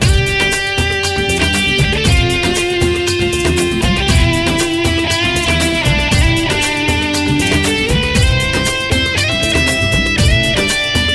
shree ram aarti

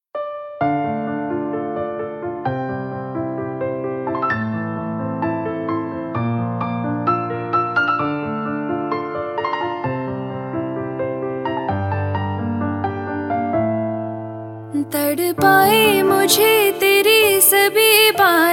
unplugged

